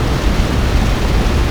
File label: vent; Tony Hawk's Project 8; XBOX